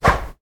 Whip

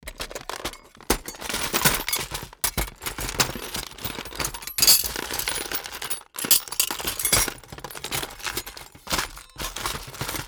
Rummaging Through A Plastic Toolbox

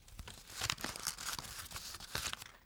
Paper Rustle

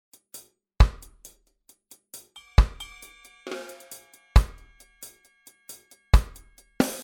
Tchack reggae

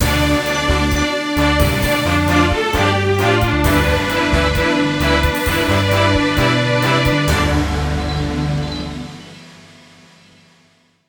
Aggressive News Jingle Short

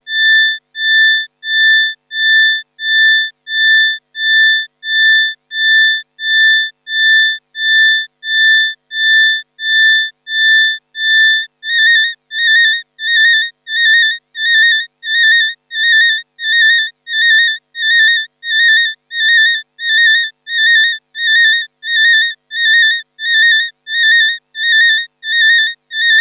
Korg Trident Mk2 PWM Modulated Alarm Beep

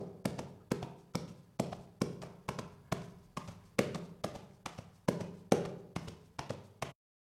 Horse Gallop

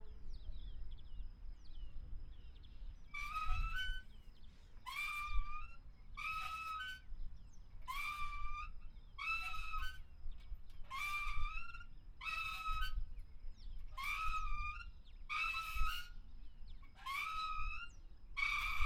Rusty Swing

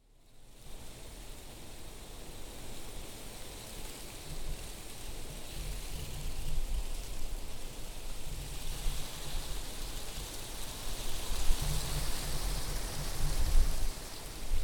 Wind In Trees

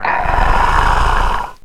Monster Sounds

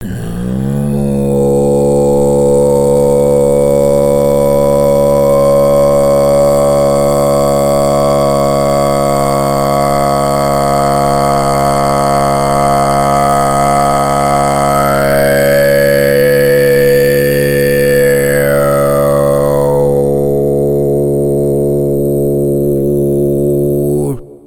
Throat Chant Reverb

